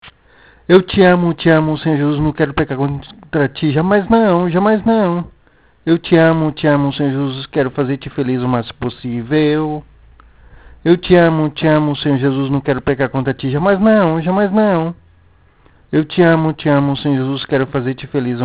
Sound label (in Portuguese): em so voz